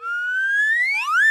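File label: WHISTLE, LATIN PERCUSSION; Best Service Advanced Media Trax Modern Composer; LATIN PC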